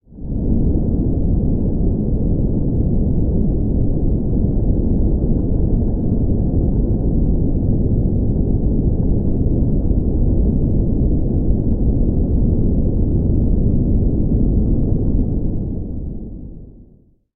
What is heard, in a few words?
Underwater; RadwinIsland